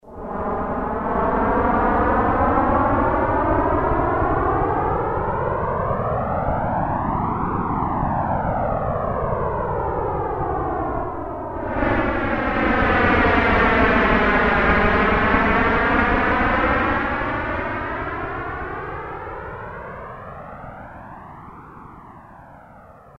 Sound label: Jet, Roland MKS-50; Factory examples